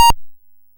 Apple II FX Bleep, Chiptune

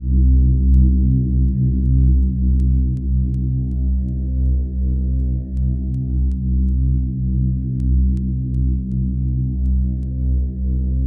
C1, Synth Leads; 90 s Sample CDs; NiceBeats (NiceBytes); Studioline 09

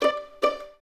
Violins D 5 Pizz; Miroslav Vitous; 11 Violins

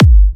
G, Kicks; markus hakala

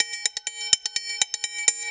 Percussion Loops, Brazilian; Houseworx

